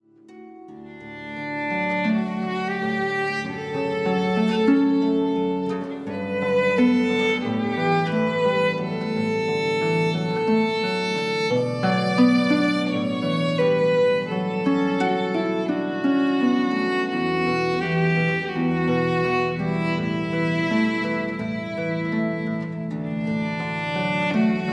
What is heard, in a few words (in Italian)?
violino arpa